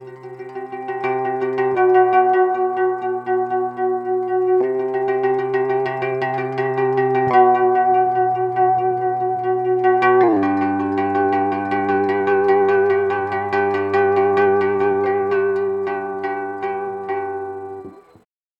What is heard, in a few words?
Trem Melo